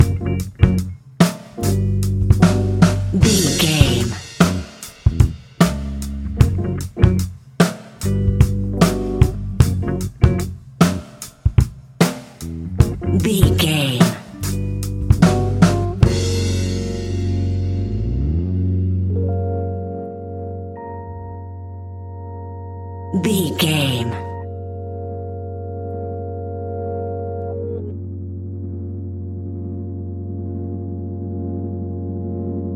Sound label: electro groove, A classic piece of Hip Hop and Rap music, ideal as a beat to rap to; perfect for budding rappers